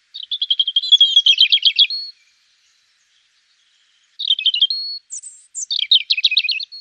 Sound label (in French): rouge gorge; environnement